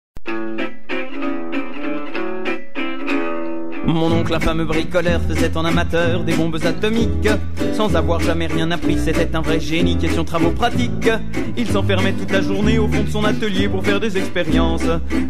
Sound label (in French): swing